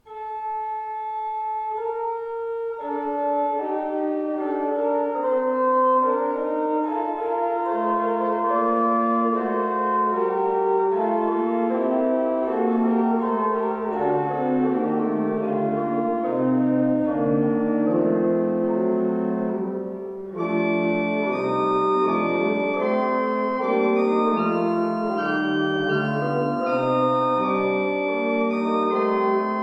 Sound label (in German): Improvisationen; ev-kirche-rothenburg-ol